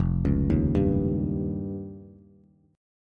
F 7th Suspended 2nd Sharp 5th, Bass, Bass Standard; F7sus2#5 arpeggiated; 1,4,1,0